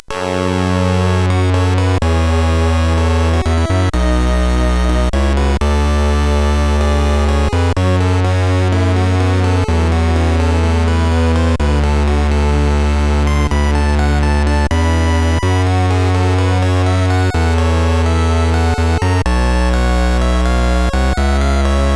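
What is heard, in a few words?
Commodore 64 SID, CSG8580R5; Koekkoe-Maen 2 C64 hax0r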